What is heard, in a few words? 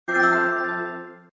PlayStation 4 Notification Sound